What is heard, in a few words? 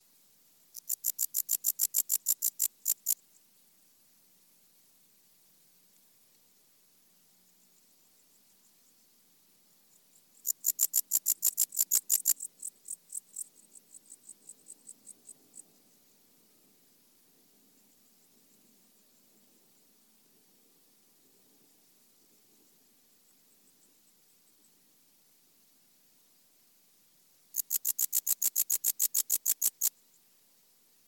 Pholidoptera aptera